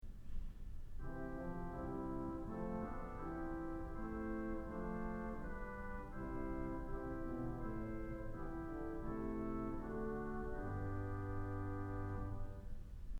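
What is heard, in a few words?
Swell Flugel; Kellogg Auditorium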